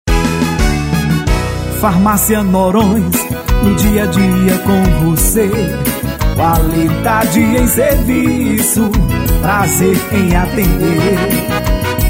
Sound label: JINGLE; FARMÁCIA NORÕES